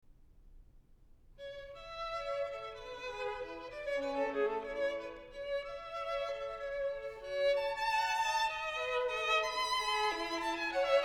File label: String Quartet No. 5 in A Major, Menuetto - Trio; Ludwig van Beethoven; Beethoven - String Quartets No. 1-6, Op. 18